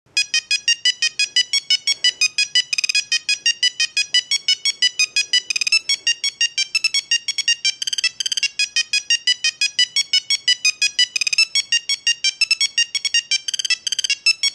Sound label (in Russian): critter, staryh mobilnyh telefonov; Мелодии пищалки старых мобильных телефонов для монтажа видео, на звонок и смс